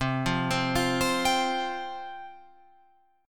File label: C Suspended 4th C, Guitar Open F; Csus4 arpeggiated; 12,12,12,12,15,14